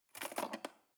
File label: cutlery cake fork drawer scrape